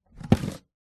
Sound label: foley wooden box case pick up from hard surface; zapsplat